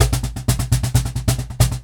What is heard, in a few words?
Cajon Fill; musicradar